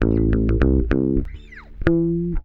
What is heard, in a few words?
bass, Roots Funk; USB Soundscan vol.41; AKAI